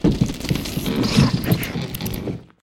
Swarm Inside Wall; silent hill homecoming 2008 gamerip pc ps3 x360

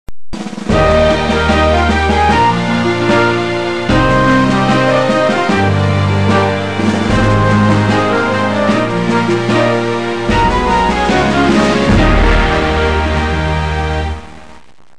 Level Completed; Graceful Jewels; Android

